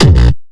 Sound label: bass kick